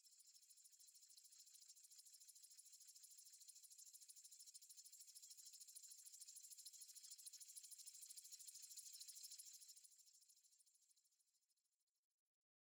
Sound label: goldroom amb